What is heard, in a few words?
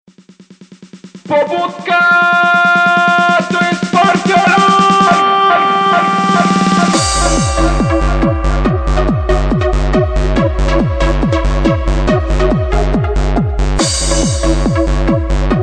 party alarm